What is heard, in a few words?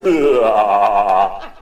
"ooh ah" male; ooh ahh